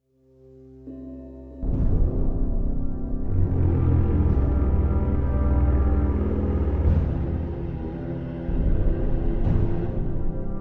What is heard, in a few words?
Horns; Nehrim At Fates Edge